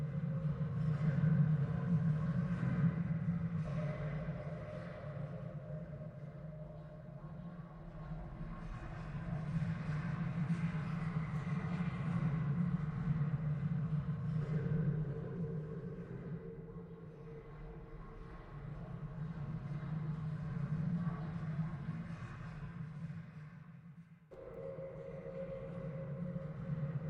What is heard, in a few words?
Lower Cliffs; Lethal Company